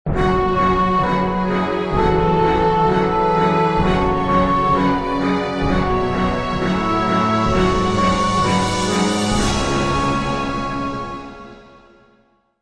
Industrial Agitated; Civilization Revolution; Xbox

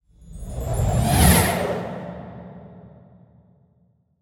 Skill1 Launch; portal quest; android; Yoda

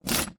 Skill Slash; Magic Shrek

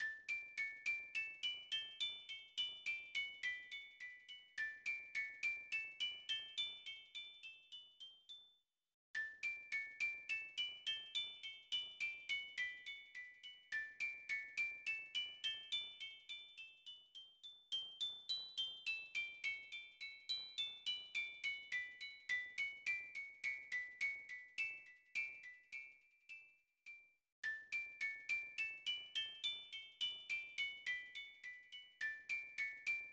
Music Box Remix; super bomberman 5

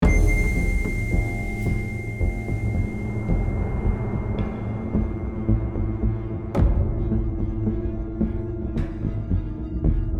Stealth (Loop)

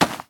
Ground short

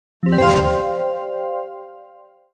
Jingle Draw; Puzzle & Dragons Gold

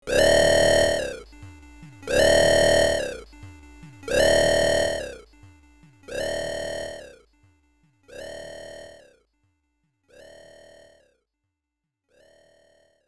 Warning; Darius Plus; Darius Alpha; SuperGrafx

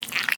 Splat

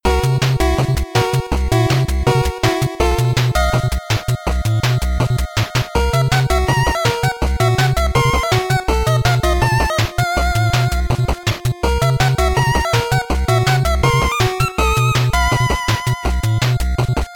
BGM 8bit; Mighty No. 9; 8-bit soundtrack

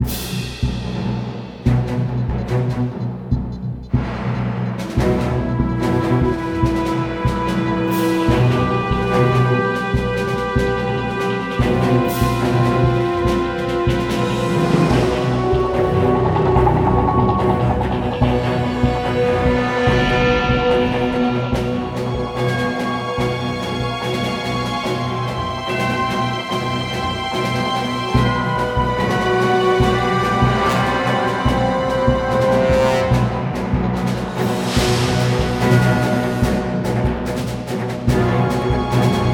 Combat Dogfight; Elite Dangerous

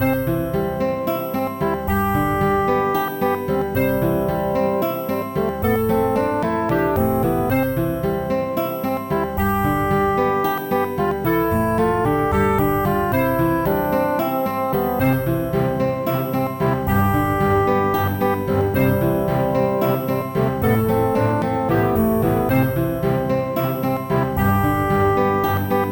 Music Village; zarah gwoor s castle; nds

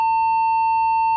tv hum

